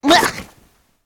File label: Yellow Hit